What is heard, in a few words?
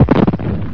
firework; marioroyale; allstarssmb